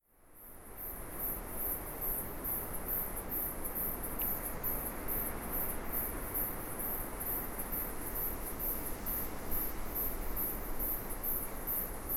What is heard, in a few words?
wind and water